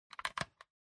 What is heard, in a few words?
Camera Disposable (Hold)